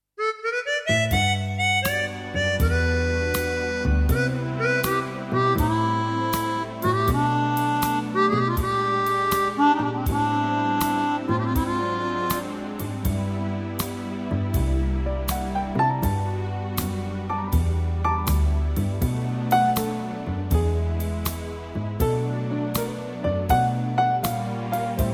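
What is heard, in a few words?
G, backing track; key - G - vocal range - F# to F#; Suitable for lower female ranges. Original Livetrak arrangement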